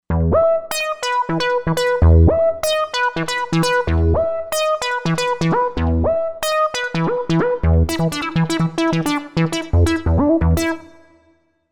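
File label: Lead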